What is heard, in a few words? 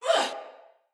Pain, Soldier Female; Sinecure Soldier Female A